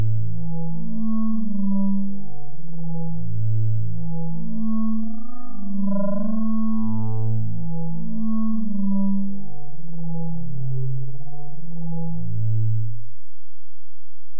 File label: even melody